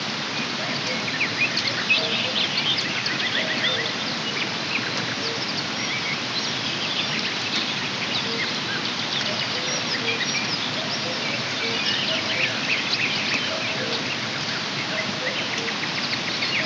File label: White Noise Rainy Forest